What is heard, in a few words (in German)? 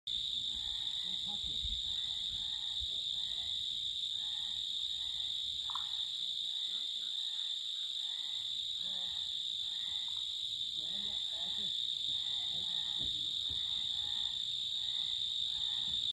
Nachtmusik